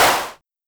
Simmons Clap

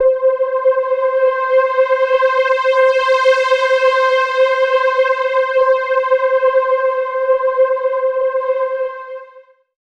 C, Pads

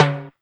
World, Yamaha DD-20

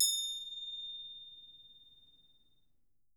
Percussion A4, Harp; Miroslav Vitous - Percussion & Harp

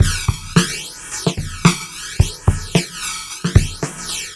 R&B Drums

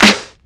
snare organic; snares

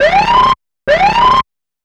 NRG SFX, Trance

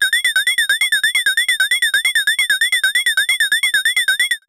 COW BELL

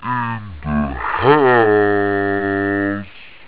hous; jazz jackrabbit 2; M8

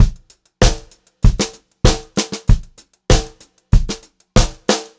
VARI, Pop-Rock Drumloops

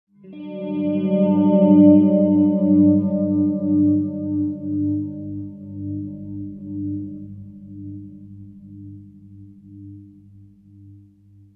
Guitar FX, Stringed Instruments, World Pop; Sonic Foundry (Sony Creative Software)

